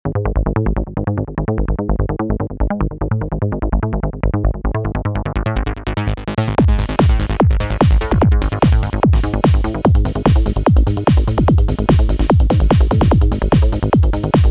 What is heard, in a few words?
Stereo Bass, goa trance; Ableton Live 12; instrument racks